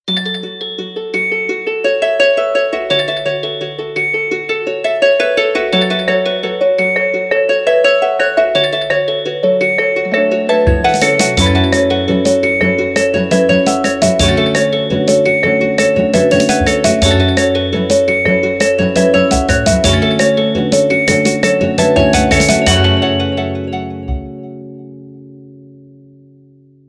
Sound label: ringtone